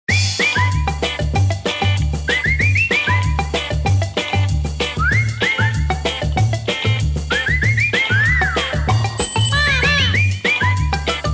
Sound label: bells